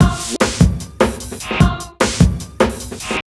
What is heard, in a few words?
150, Big Beat Drumloops